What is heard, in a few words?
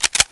gun load